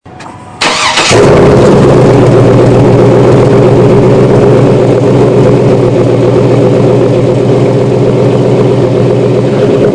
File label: Idle, Engine; 2002 Chevrolet Camaro SS; At Idle